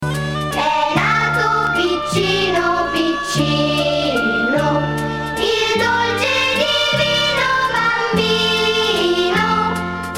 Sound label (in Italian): Le campane di Natale